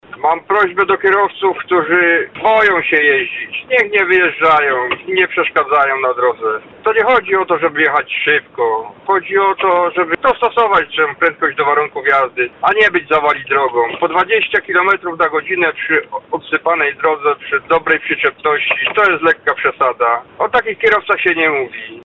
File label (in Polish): zima alarm